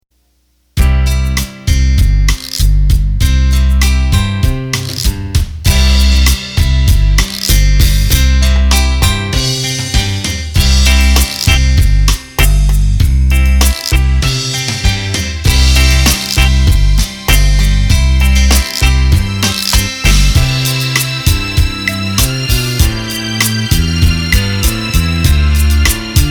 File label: Bolero Rumba, Roland accordion; roland bk7m